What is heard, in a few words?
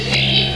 Zeep